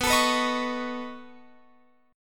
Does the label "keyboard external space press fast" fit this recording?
No